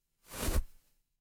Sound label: paper fabric fabric